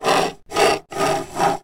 household sunglasses plastic slide on wood table x4 short; zapsplat